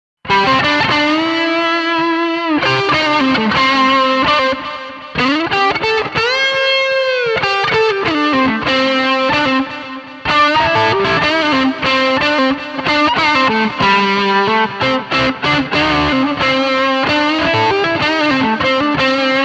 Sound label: pod tubedriver